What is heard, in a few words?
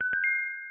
Exclamation